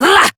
Exert Adult, Melee Swing; New War; Operator

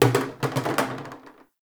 Plastic Hit

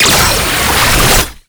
Gunbrella Alt Charge Up; Weapons; Warframe; Tenno; Koumei

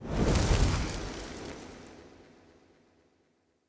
Short Sword Fire Blade End; Weapons; Elemental

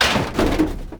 Military Checkpoint Wall Destroy

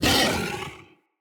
Kubrow Feral Vocal Angry Attack Charge

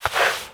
Crouch Move Foley; Warframe; Characters; Tenno

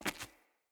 Walk Stone, Footsteps; Characters; Mother

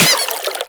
Water Sprayer Beam Impact Flesh; Warframe; Weapons

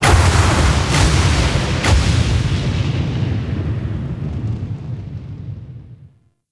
Weather Angry Transition; Ambience; Gameplay; Warframe; Duviri

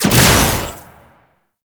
Shot, Spear; Warframe; Tenno; Priest Spear; Scourge Prime

